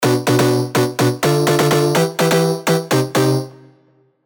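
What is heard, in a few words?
synth, old skool synth; Da Capo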